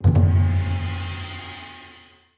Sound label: levelup intro, interface